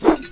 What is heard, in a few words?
swish; ROADRASH